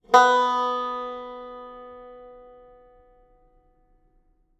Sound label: banjo